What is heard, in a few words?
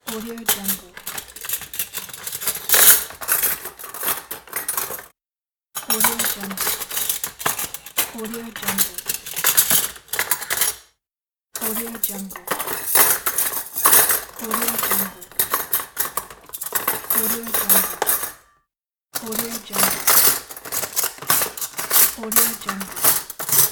cutlery